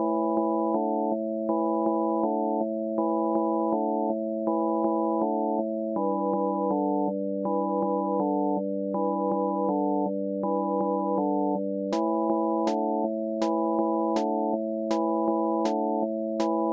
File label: menu music; Fronter